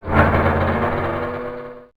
Avion; alone in the dark 4

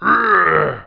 cri

